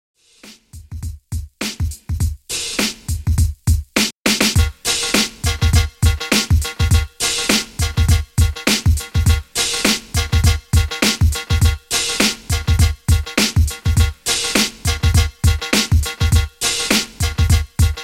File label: Old School Redrum; 102Bpm